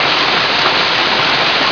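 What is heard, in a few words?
waterfall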